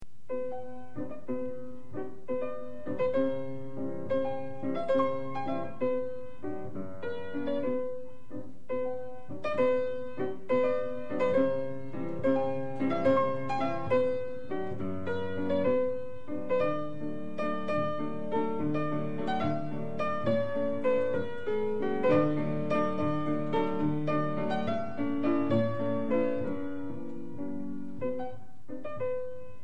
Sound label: folk dance B minor